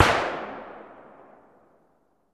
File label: Gun Shot Sngl Ext